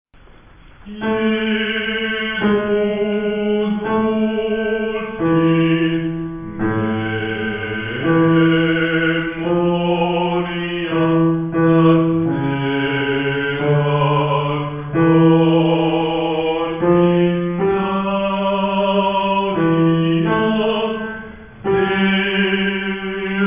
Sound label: Basses; Jesus dulcis